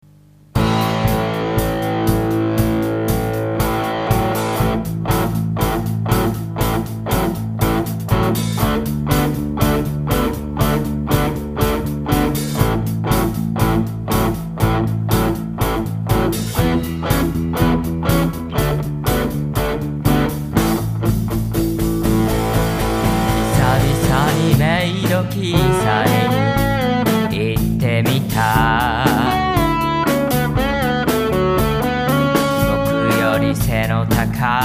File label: blues